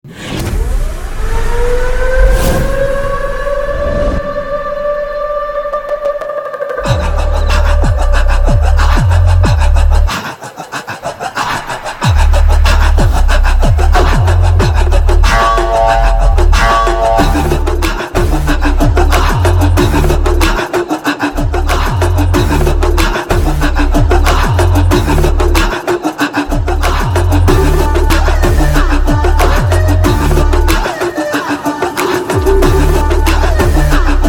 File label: Entry BGM Mix; RED; Aditya